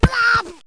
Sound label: Vox Hit; Rabbids Big Bang